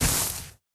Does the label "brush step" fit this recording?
Yes